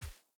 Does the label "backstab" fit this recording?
No